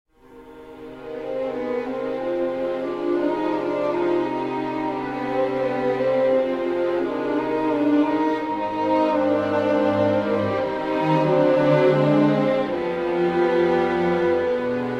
Strings Slow Version; J Baby; Tamil

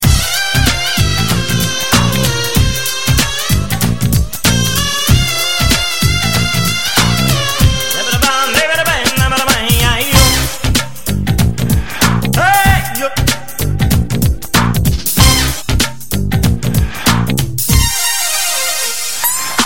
Ringtone, Tamil; 2009 Tamil Hit Ringtones

